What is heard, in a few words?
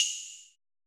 perc, Roland MC-909